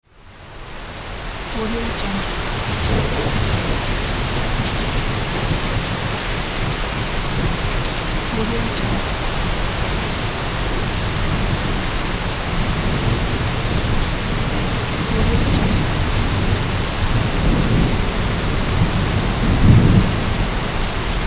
heavy rain with distant thunder

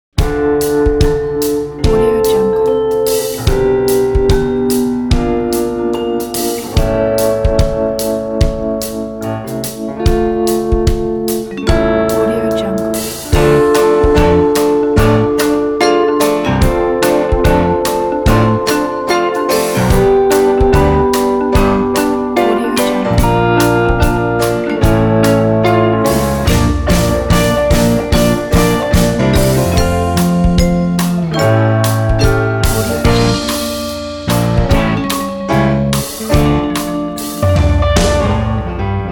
uplifting blues

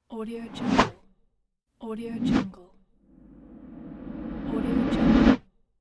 whoosh slow low zooming; bestsfx

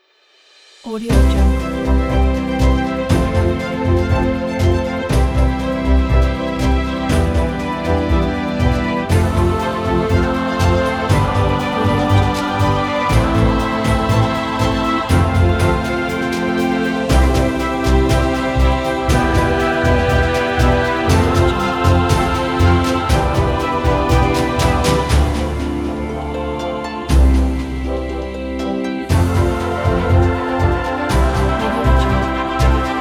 epic hymn

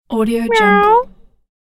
cat meow

